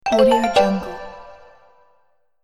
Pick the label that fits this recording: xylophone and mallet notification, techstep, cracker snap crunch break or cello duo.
xylophone and mallet notification